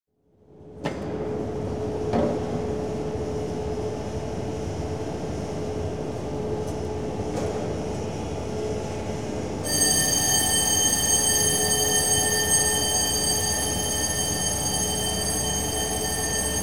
Ship, CAR Ferry, 17,400 Tons; Transportation; BBC Sound Effects Library

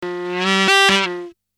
Aliens Monsters; FXHome

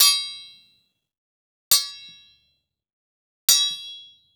Machete Hit Ring; Soundopolis; Blades; Fienup

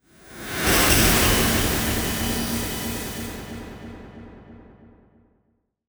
transition

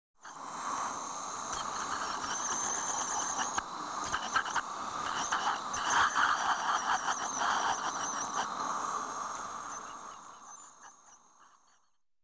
AT THE Dentist, Polishing; BBC Sound Effects Library

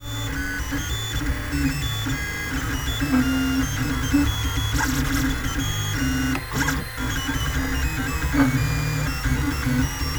3D Printer Warm Up Sequence, Lulzbot mini; MattLightbound

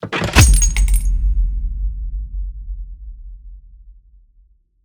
Complex Cinematic Horror Sounds; Federico Soler Fernandez

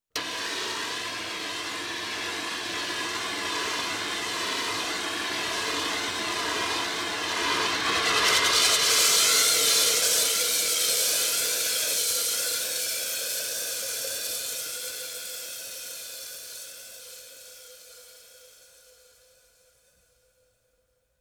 CO2 Type Fire Extinguisher Used; Emergency; BBC Sound Effects Library

